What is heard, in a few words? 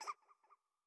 Bat Hurt; Going Under